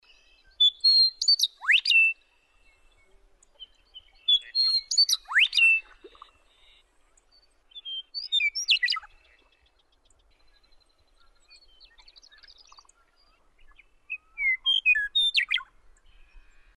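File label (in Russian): zapadnyi medoulark penie, western meadowlark singing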